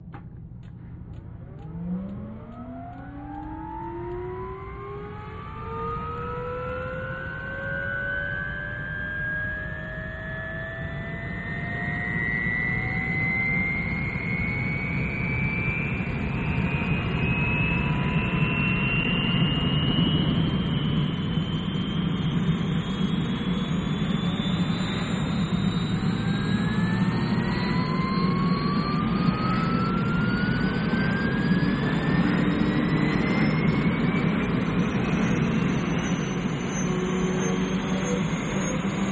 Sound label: UH-1 Huey: Interior Start-up, Power-up To Idle